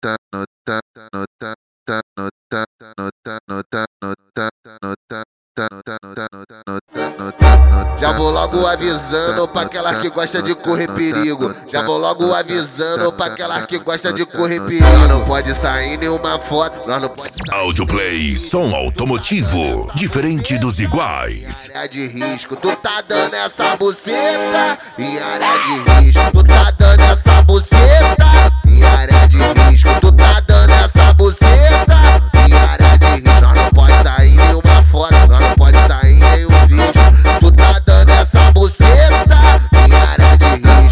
esp funk mega bass

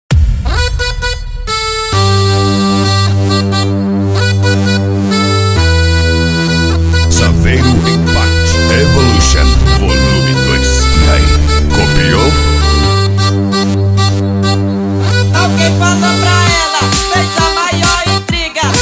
eletro funk; saveiro impact evolution vol2

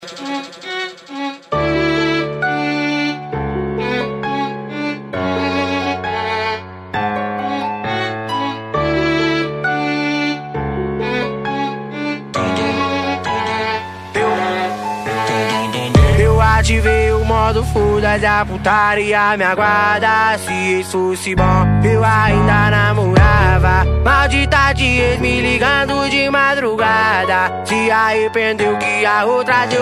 funk e rap

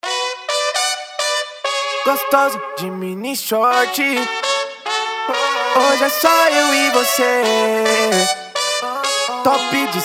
pagode e funk

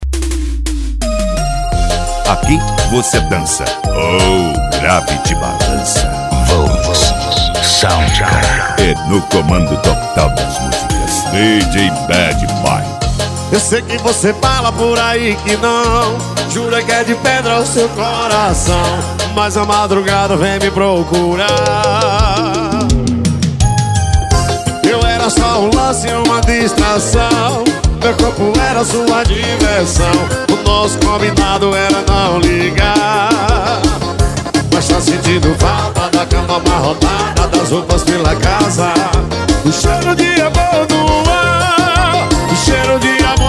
sertanejo e pisadinha; cd volks sound car

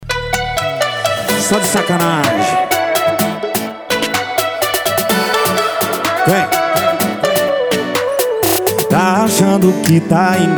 sertanejo

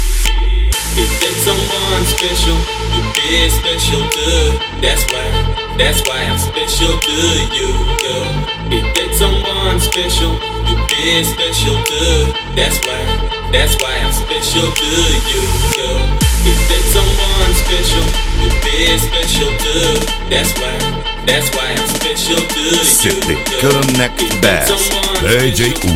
bass house e rap

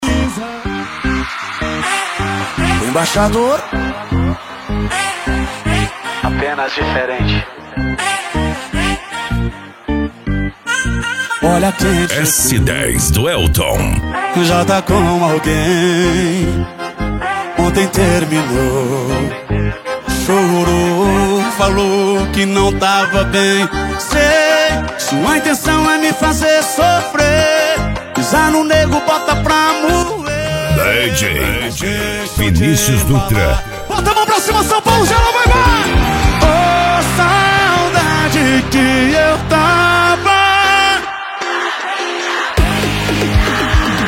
sertanejo

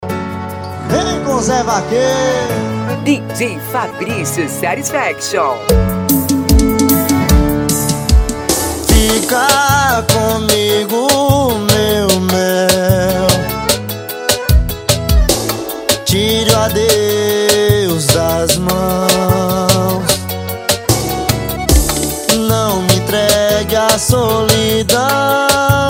deep house e pisadinha

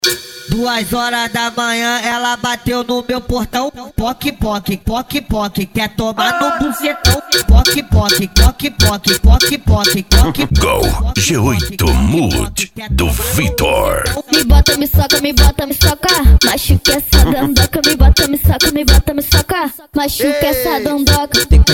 funk ritmado

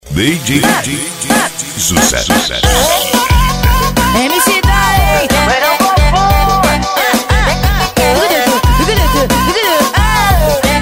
piseiro

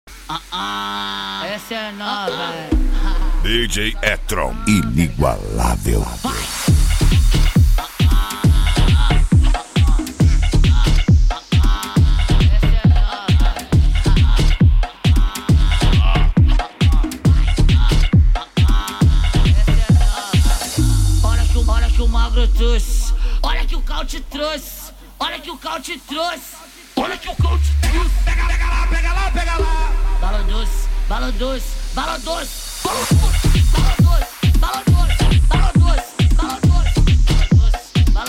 mega funk funk

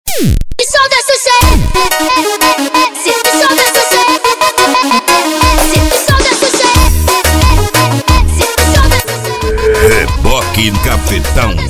tecno melody; reboque cafetao

